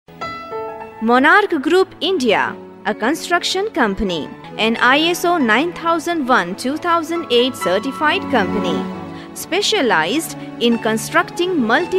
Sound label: caller tune